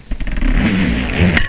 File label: zipper